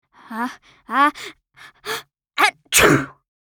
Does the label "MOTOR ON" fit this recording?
No